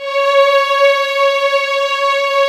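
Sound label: violins; String Master Series; Vlns 6 mf-f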